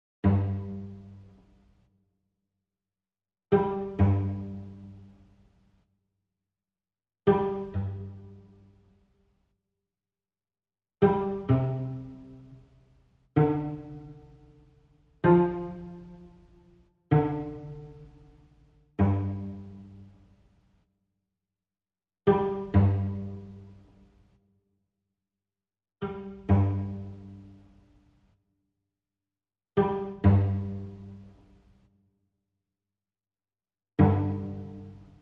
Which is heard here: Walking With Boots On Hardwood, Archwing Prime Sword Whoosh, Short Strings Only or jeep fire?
Short Strings Only